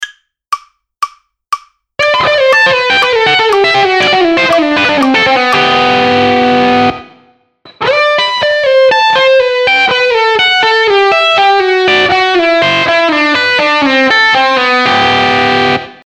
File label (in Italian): Esercizio Hybrid Salto 1 corda, guitar